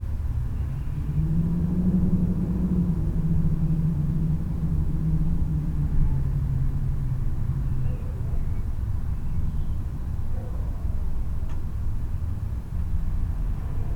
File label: Room Tone Apartment Wind Day